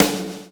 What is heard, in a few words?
PULL, Backbeat; Spectrasonics - Backbeat